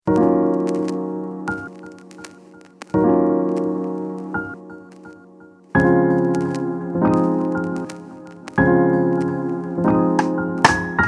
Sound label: 85 BPM; lo-fi; December mood